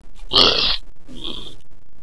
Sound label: pain; sawer; boss